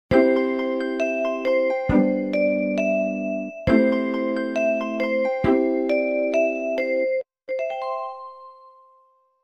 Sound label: open, BGM